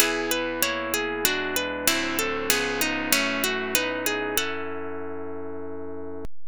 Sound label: Guitar